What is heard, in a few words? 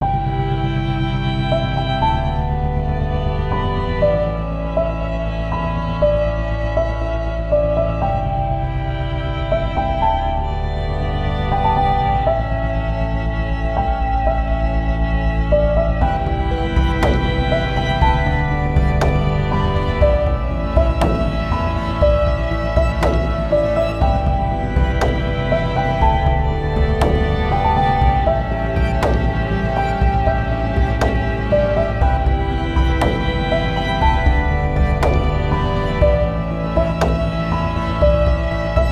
backwards, piano and strings and synth; the music room; fall samples